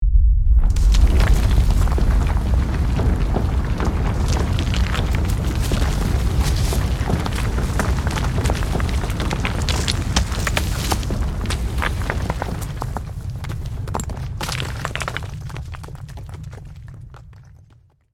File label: Earthquake building collapse debris large rock destruction